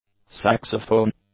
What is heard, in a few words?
saxophone